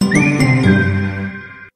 jingle ads; gojek

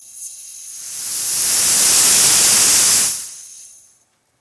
Canned Air

